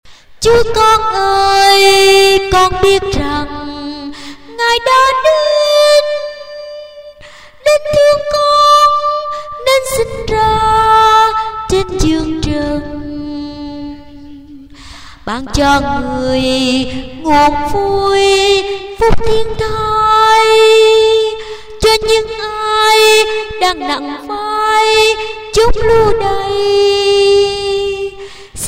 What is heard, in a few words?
sop alto